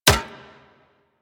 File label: Rail Jack Hit Notification; Enemy Ship